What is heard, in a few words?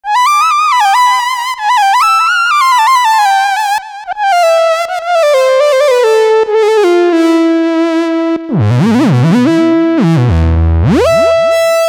Synth Soaker